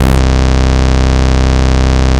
Ultimate Bass Root C; Vengeance Samples

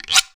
Percussive FX; Vengeance Samples; VEH1 Percussion

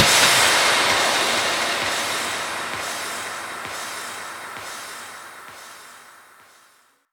FX Downlifter

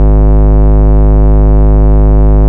Bass, Root G#; Vengeance Samples

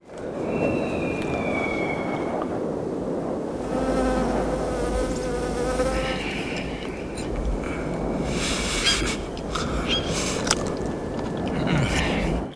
Ambiences, Places and Ambience; Vengeance Samples; FX Vocals

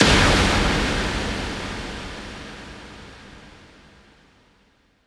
Hits & Explode

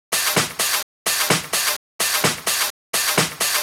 House Loop, 128 BPM; Vengeance Samples

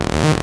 Synths D#; Vengeance Samples

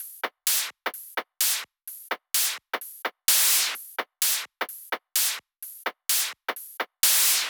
128BPM Kit; Vengeance Samples; VFH1 128BPM Wanted Kit

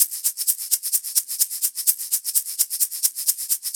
Shaker Loop, 128 BPM; Vengeance Samples

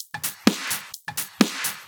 House loops 128BPM; Vengeance Samples

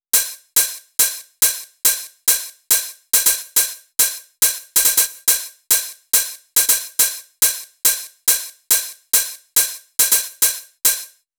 Open Hihat, 140 BPM; Vengeance Samples; VTDS2 Song Kits; FX Vocal Candy Guy

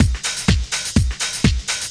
drumloops 125; house; PS-28 Vocal House Samples; livezone

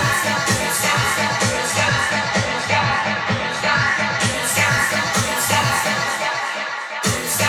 House Loops, Vocal Loops House 128BPM

